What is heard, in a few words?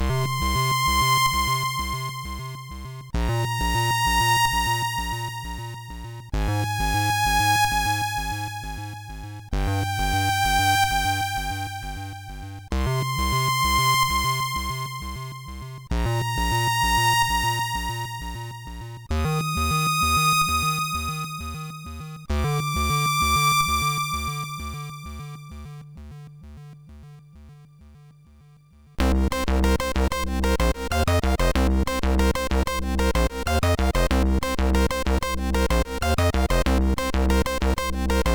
Loopin, sid MOS6581R3